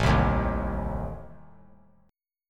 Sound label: A Diminished A down; Adim strummed; JGuitar